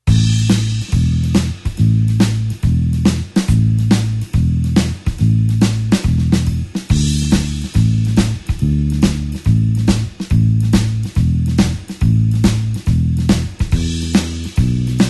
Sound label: blues bassline shuffle A